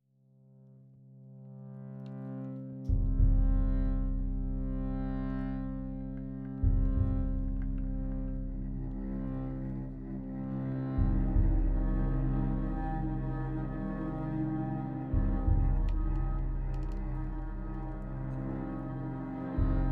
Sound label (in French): Classique